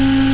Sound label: cpu FAN